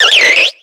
Grito; Cherubi; grito en la sexta generación (XY)

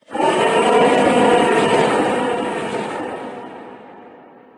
Grito; Corviknight Gigamax

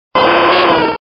Grito; Arcanine

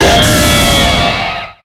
Grito de Giratina

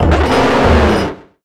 Grito; Conkeldurr

